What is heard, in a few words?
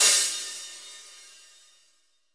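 hi-hat, GM drum set; AKAI S-Series CD-ROM Sound Library VOL-8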